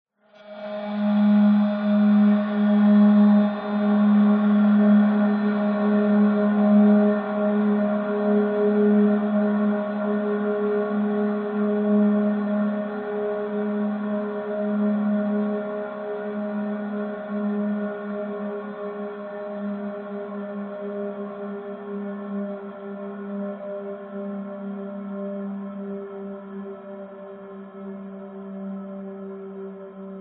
horror accent tense chilling fear shrill metal scrape processed; zapsplat